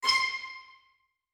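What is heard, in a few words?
woodwind string xylophone note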